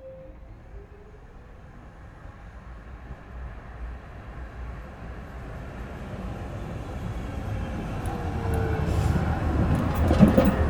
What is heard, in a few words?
tram rolling; best service Blue Box